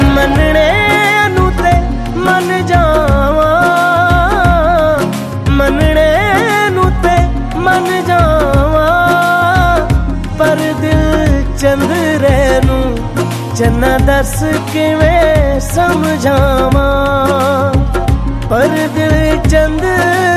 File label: Punjabi Ringtone